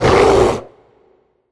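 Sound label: human big male damage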